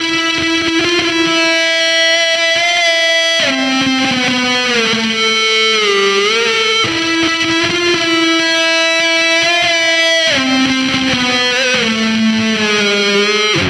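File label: guitar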